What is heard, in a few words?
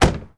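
Truck Cherokee Door Close; SFX Kit; Trucks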